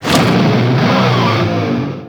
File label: Subwoofers Cast 3D; Warframe; Temple